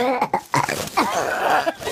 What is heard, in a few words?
Helium burp